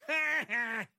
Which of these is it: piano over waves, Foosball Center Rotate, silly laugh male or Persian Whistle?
silly laugh male